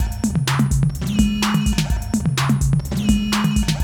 ACID JAM

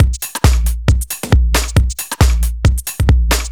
Beat, 136bpm, uk garage

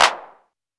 technox clap; Quasimidi Technox

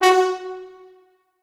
F#3 L, Orchestral Brass; Best Service ProSamples vol.20; AKAI

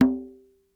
Apentemma Med, World Percussion; Sony CS

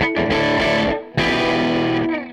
Gretsch Guitar Loop

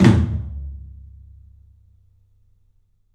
PA TOM, 14 TOM; 3D Sound; 3D Drums; CLUB 14 TOM

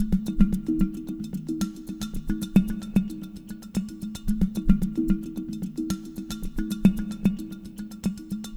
AMB; Spectrasonics; Liquid Grooves 1 & 2

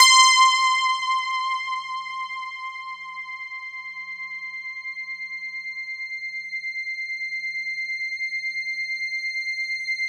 C5, SynthLine; 90 s Sample CDs; NiceBeats; SynthLine VOL-11

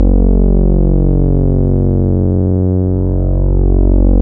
PAD PAD

